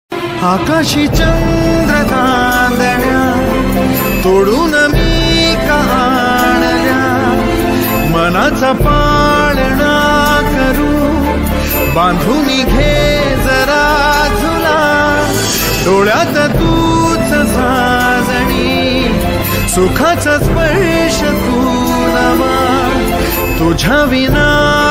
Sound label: sad song; kakan movie